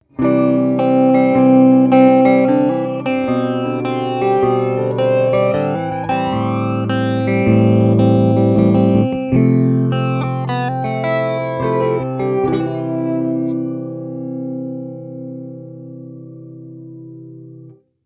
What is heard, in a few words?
chorus flanger; line6 spidervalve; effets